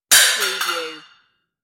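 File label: Metal Lid Floor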